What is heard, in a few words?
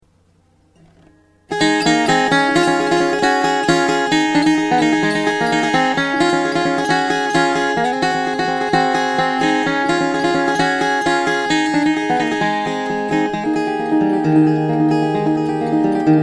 banjola; Gold Tone Banjos; jhardy